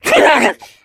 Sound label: pain short, voice; video game; source engine; left 4 dead 2; jockey